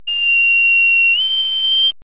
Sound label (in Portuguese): apito